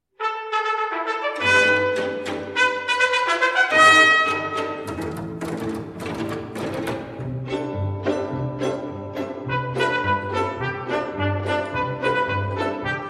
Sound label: Trumpet